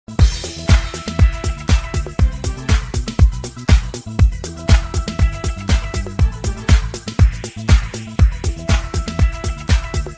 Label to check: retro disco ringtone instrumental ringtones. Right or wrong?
Right